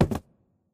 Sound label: phys cube, physics